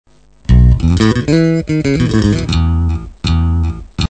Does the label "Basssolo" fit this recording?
Yes